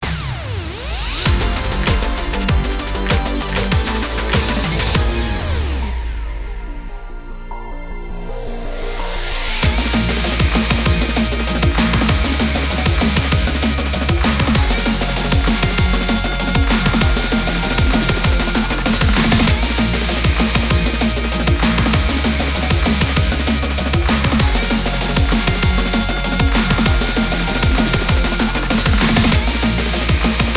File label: Battle Card Summon; the king of fighters all star; android